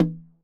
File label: Tom Acoustic Dead